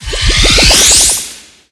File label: sphere firing powerup; Source Engine; Portal 2